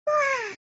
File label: mouse; slotMousebundle